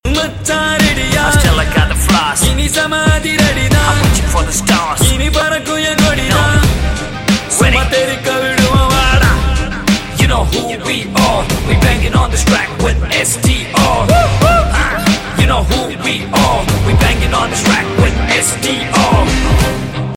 ringtone

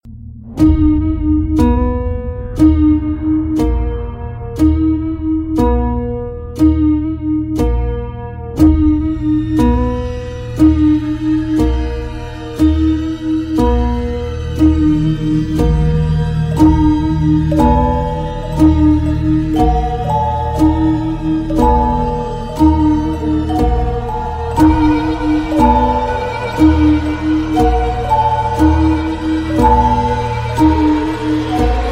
theme bgm; ratsasan

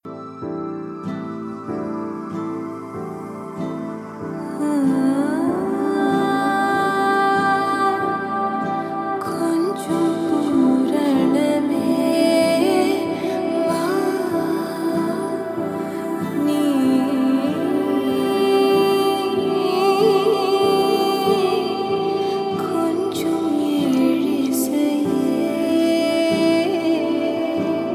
humming; tamil; 96

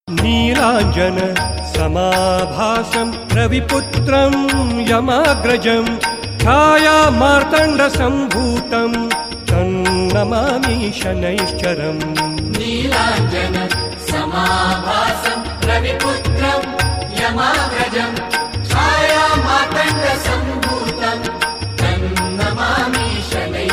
shani mantra ringtone

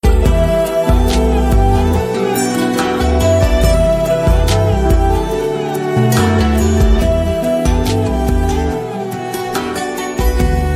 bgm ringtone; tamil; kanchana 3

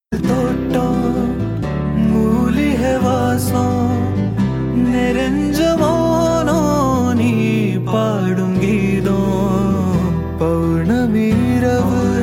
male

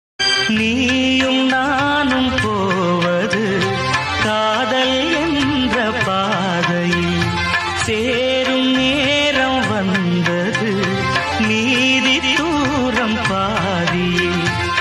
ringtone music

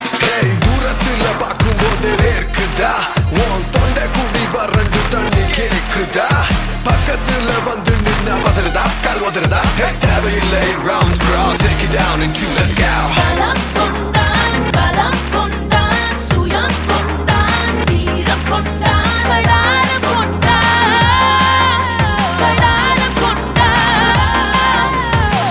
male ringtone, tamil ringtones; kadaram kondan

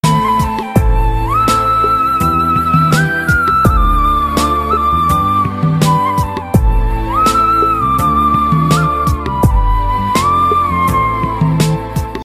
flute tone ringtone; telugu; nannaku prematho

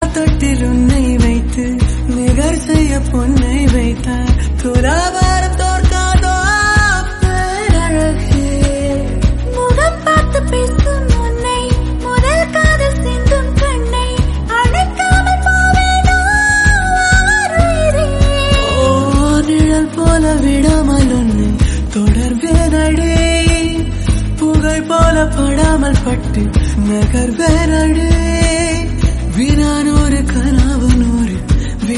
lovely tamil song ringtone

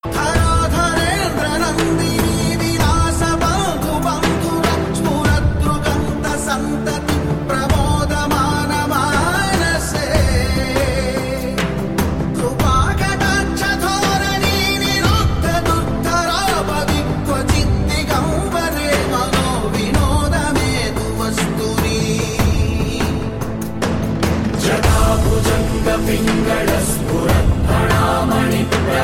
ringtone, telugu; telugu ringtones

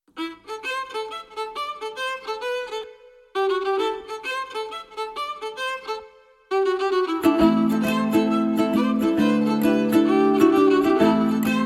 bgm; telugu ringtones

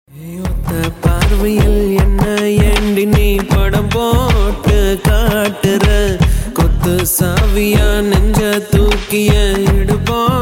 male; tamil; kadamban

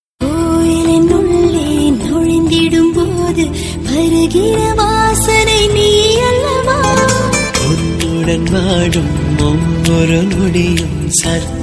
romantic love ringtone

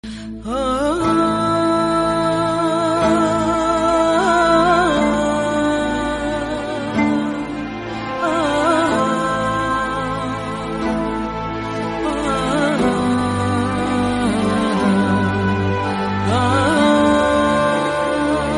sad music; ertugrul

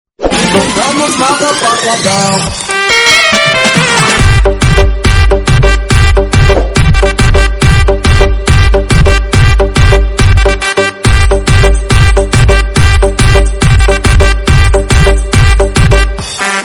bgm

